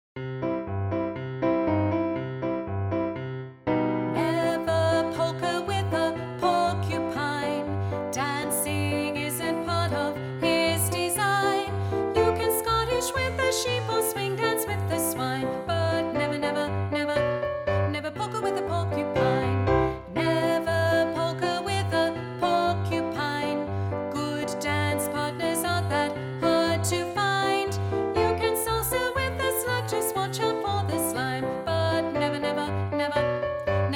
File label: Soprano Teaching